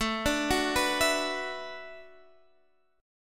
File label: B Minor 11th A